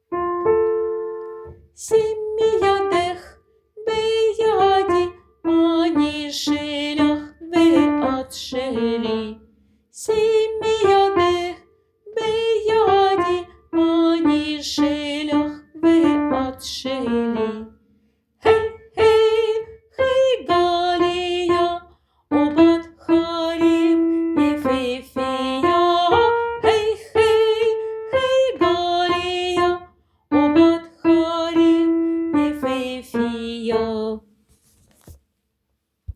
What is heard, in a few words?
Simi partie Alto; Simi jadech